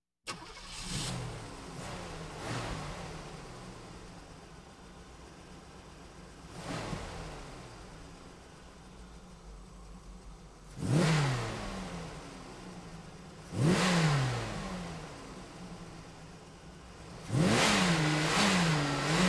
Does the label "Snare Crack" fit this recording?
No